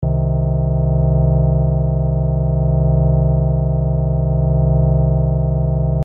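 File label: Stereo Panning and Movement Slow, using a slow sine wave LFO to modulate the pan position can create a sweeping effect, with the sound moving from left to right in a smooth, fluid motion.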